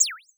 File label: menu selected; frozen-bubble